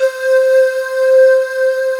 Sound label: CHINA PIPE; East West; Global Sounds; VFX-SD